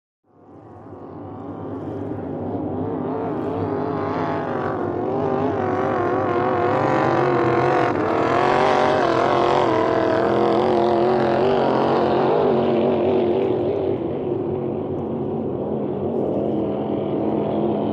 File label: Hydroplane By Light